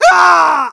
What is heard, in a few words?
pain